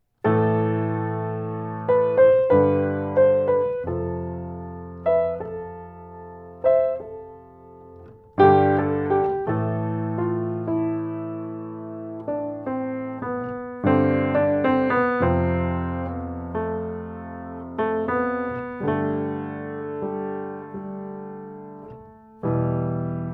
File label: Piano; AMI; Schoeps CMT541